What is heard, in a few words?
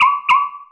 reminder